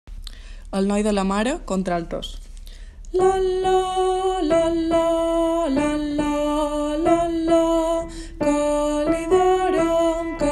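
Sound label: alto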